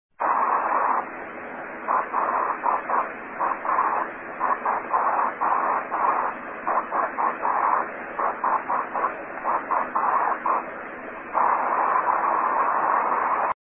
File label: 2m, vhf